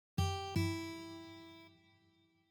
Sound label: Pull Off